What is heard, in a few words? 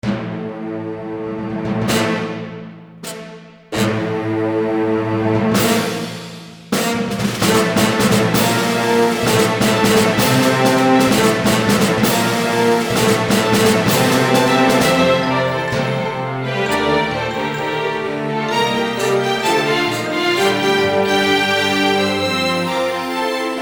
Big Cool Orchestral in Ternery